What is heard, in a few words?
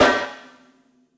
Snare